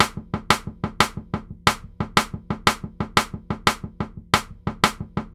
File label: Delayed B; Сэмплы ударных (Легкая музыка); 90BPM; Beat; Drums; Easy Listening; loop